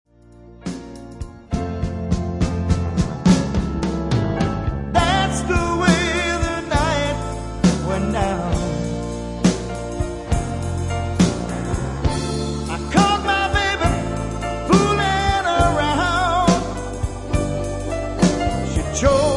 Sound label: Blues